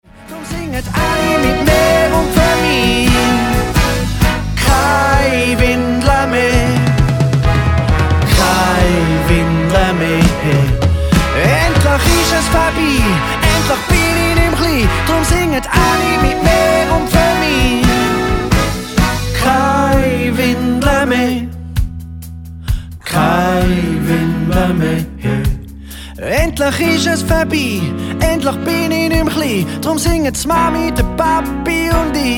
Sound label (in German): mit pauke und trompete